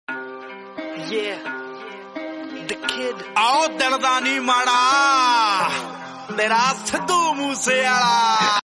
romantic punjabi

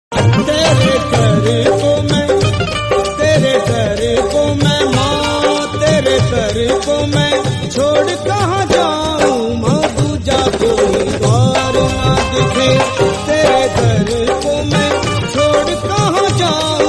bhakti song

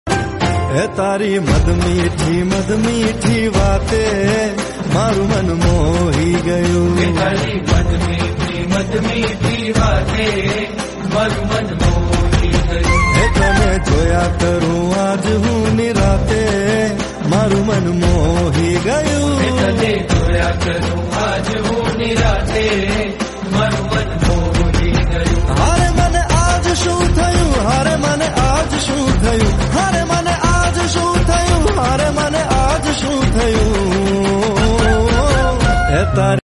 gujarati song

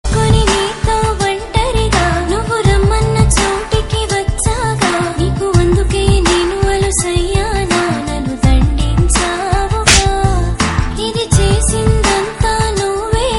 telugu female songs

